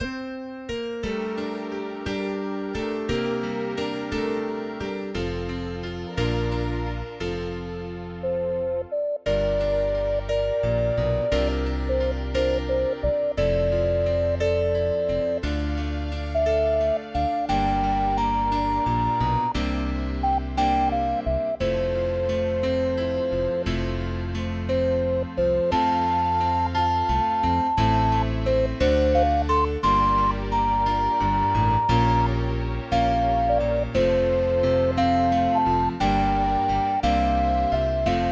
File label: piano